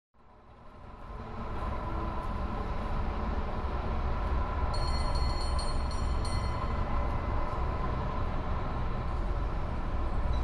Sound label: Cable Car Binaural